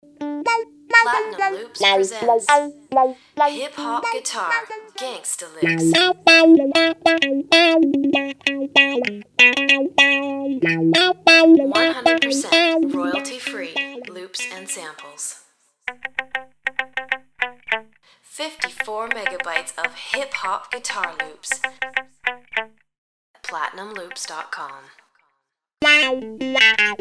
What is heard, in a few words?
gangsta guitar hot licks